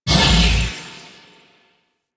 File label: Moon Foot Switch Release; Ambience; Warframe; Orokin Moon